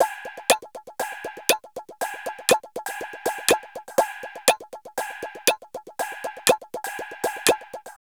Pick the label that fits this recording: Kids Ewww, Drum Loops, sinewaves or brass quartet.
Drum Loops